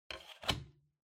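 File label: Ceiling Light (remote control Holder Put In)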